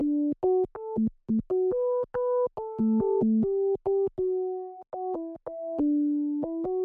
Happy House Organ

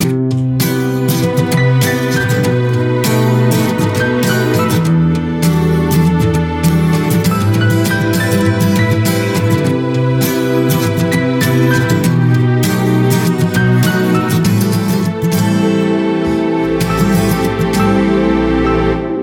Orchestra Sound Loop

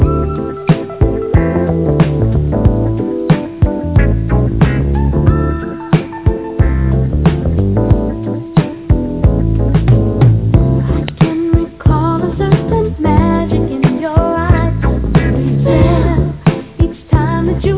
Funk Loop

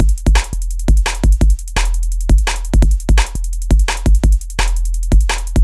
Heavy DnB

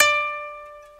Japanese Koto in D4

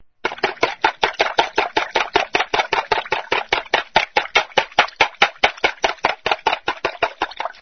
Kitchen Plates

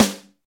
Jazz Snare

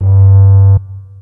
Humming Sound

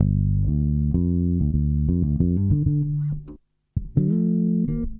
HipHop Bass

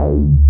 Bass Hit

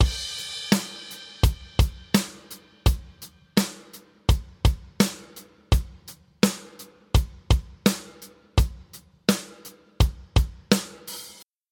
Live Drum Loop

